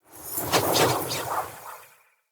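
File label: Staff Caster Projectile Whizz By Heavy; Weapons; Player; Staves; Starter Staff Caster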